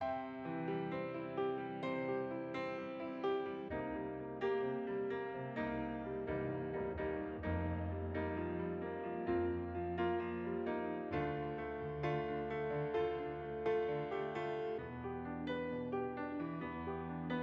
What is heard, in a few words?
Piano Part